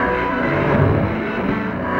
Burning, Electric Guitar; Sonic Foundry (Sony Creative Software); Friendly Fire; Wrath

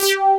DRIVER BASS; Pro-Rec - Synthsonic; BASSES

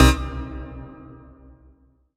Dance Mega Synth; Best Service; 90 s Sample CDs

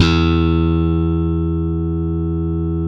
POP BASS, Electric Basses; PlatinumPhattCD2; E-MU Producer Series Vol. 8 – Platinum Phatt

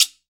GUIRO DOWN, Latin Hand Perc; E-MU Producer Series Vol. 2; More Studio Essentials; Composer

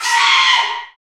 Bullhorn, Afro Horns, Africa

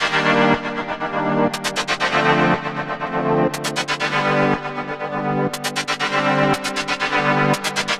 Dreamy, Arpeggios & Sequences; Dr. Fink's Funk Factory

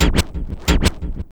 SCRAT; HHFX 89

